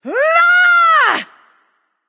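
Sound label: battlecry, female; smite; voicepack; hera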